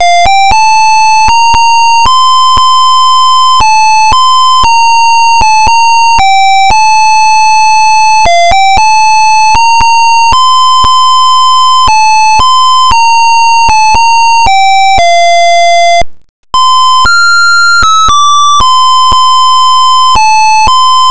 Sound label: home sweet home, melody